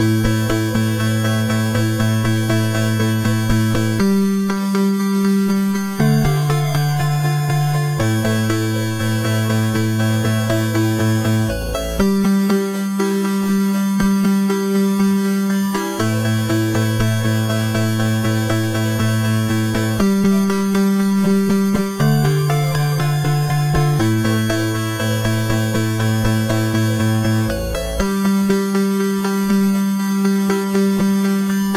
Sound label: chiptune puzzle game cheery battle mode; connect